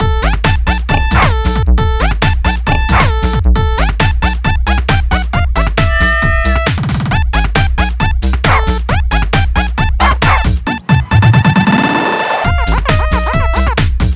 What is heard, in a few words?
Animal Disco; otojiro